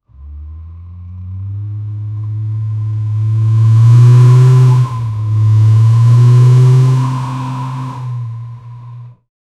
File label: car engine revving aggres; automobile